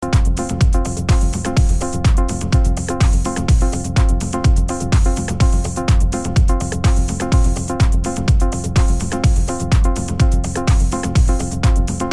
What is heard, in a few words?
Women On Drums